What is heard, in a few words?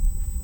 Hydrophone